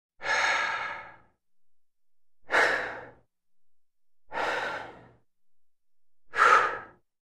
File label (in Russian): zvuki vzdohov